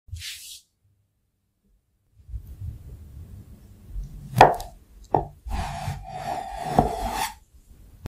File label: cake cutting